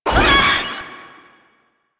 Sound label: Proto Infested Maggot Attack, Enemies, Vocals